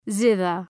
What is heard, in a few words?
zither